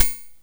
cymb, cymbals

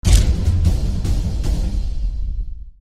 drums that may be useful in

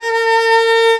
VIOL A#3, VIOLON, Arabian Traditions; USB Soundscan vol.43; AKAI

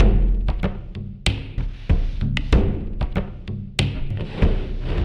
Ringtone; Sonic Foundry (Sony Creative Software); The Electronic Music Manuscript Collection; Drum Loop Structures

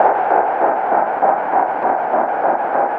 synth sfx; Chillout (ambient1&2)

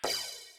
crash, Millenium MPS-400

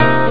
trump piano; Amiga Soundtracker Sample Packs

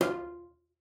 CELLO FINGER F4; Xsample VOL-5

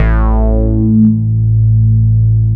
moog A; Techno Trance Essentials

